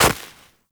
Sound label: Operator Walk Snow, Footsteps; Warframe